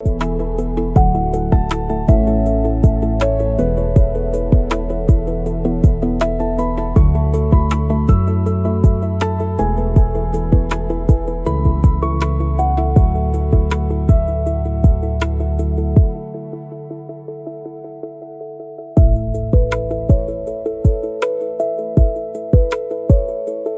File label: loop